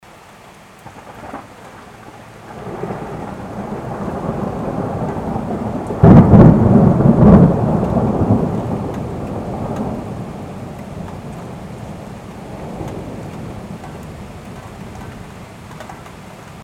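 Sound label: sound of thunder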